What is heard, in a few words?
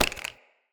Acorn Pickup